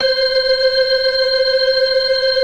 FAST VIB, TREMELO+VIB, Synth and Organ

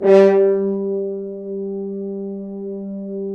BRS, FHns Accent; Roland L-CDX-03 Disk 2

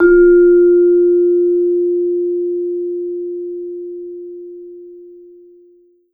VMED F3, Vibes; Sampleheads - Dave Samuels Marimba & Vibes

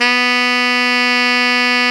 SAX TENOR F, SAX Tenor ff

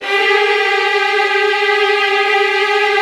VN TRILLS, Virtuoso Strings

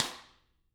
Drums, SNR; CAMCO

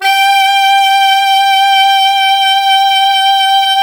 SAX 2 Altos G 5; Alto Sec

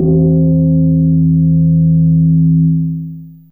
Chimes C2, Fairlight CMI IIe, Mallets

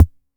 BD, Video-Tech Rythmic10

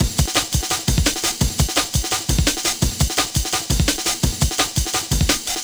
Kick Break, Drum & Bass; Fluid Dynamics

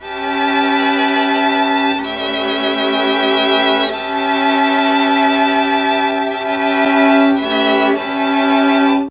D#3, Mellotron; Mike Pinder - Mellotron Archive CD

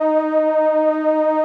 SOLINA, String Machines

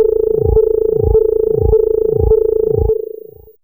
Phone, Fairlight CMI IIe

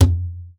CONG BAS2, conga; Best Service ProSamples vol.42 - Session Instruments

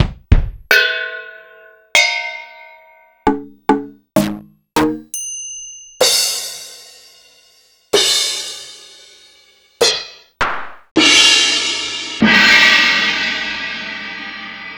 Simmons SDX

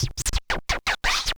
SCRATCHES; Scratch 1

